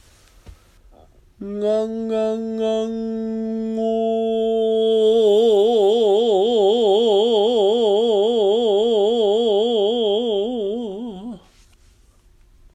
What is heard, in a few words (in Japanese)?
クレッシェンド 男性